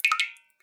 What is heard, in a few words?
Drips